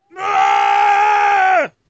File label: Raaaaaaa